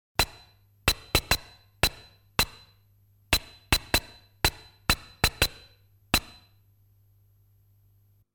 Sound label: Sword Striking SFX